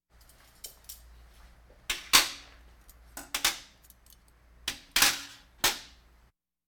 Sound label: Hospital effects